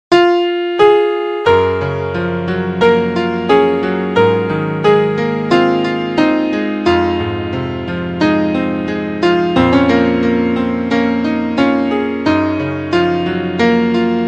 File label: Piano Ver